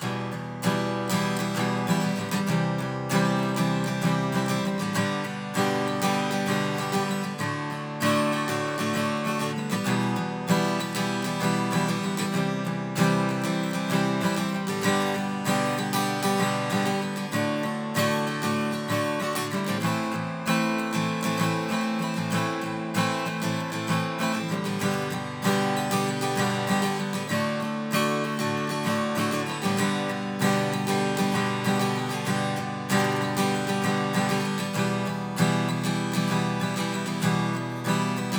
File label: Strumming Filter; Single Take